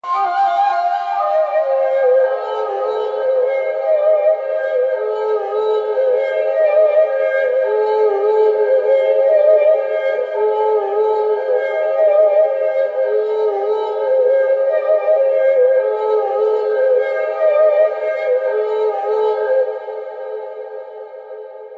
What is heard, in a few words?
echoey trancy flute riff; MELODIC SAMPLES